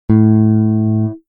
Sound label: Squier telecaster A string